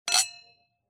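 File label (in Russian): хрустальные тяжелые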